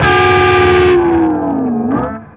HARMONC DRAG, Guitar; East West; Steve Stevens Guitar Samples Collection

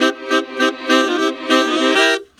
Sax Section Effect, effected sax section

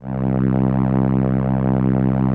SOLINA, String Machines

